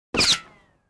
gun ricochet; NCH Library

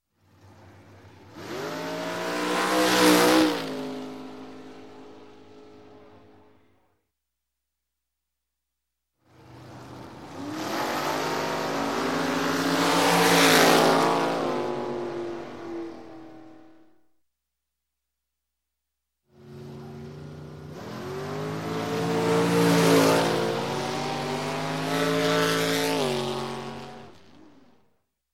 Dragster Race By x 3; Sound Ideas; Series 2000

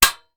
Rifle Foley; Sound Ideas; Guns

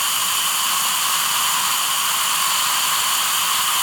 Steam Loop

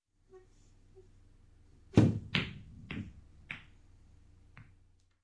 Billiards Bridge Shot; Sound Ideas 2000 Series

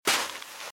Footsteps - Sand - Dry - Walk - Right

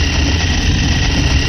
Scrape Large Ice Block Loop; Doors

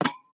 Peg Leg Footstep; Pitch Black - A Detective's Demise

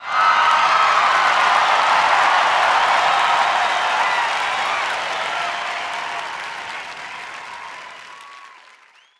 BMX Crowd Cheer Medium; Crowds

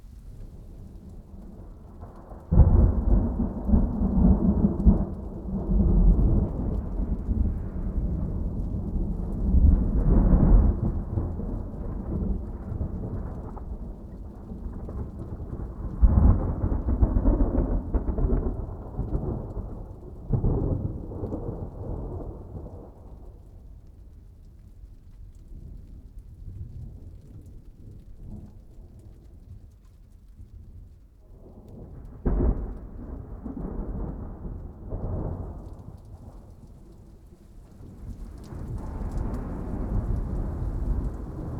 Thunderstorm very light rain; boom library; Thunder And Rain

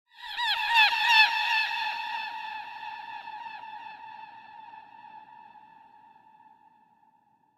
Gull Eerie Croak - Pass By; Hollywood Edge; sound designer's toolkit v2

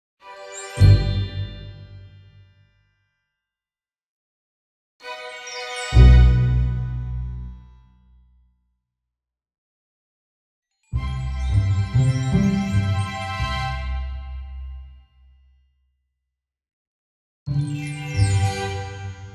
Orchestral Jingle Positive; boom library; The Interface

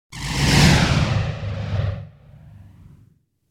Whoosh Firing Phased

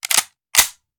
Machine Gun Foley; Sound Ideas; Guns

